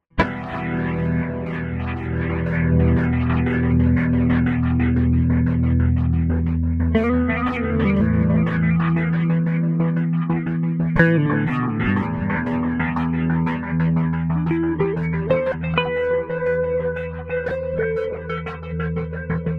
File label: Rhythmic Rotator